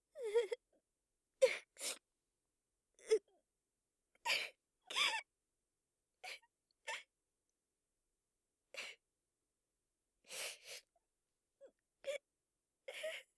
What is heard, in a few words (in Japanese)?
屈辱的; 効果音あり